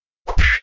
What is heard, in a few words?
SFX Emoji facepalm; Golf Blitz 1.12